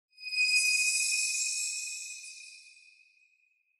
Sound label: Onoma Sparkle Low